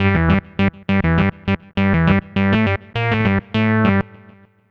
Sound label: Synth Lead; Trip-Hop; Loops; Sonic Foundry (Sony Creative Software); Rondo Brothers Trip-Hop Loops & Samples; Liberation